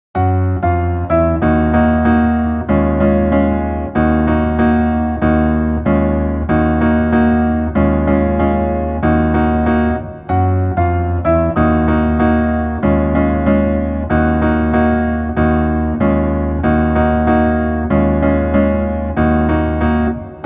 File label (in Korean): Piano ver, 멜로디MR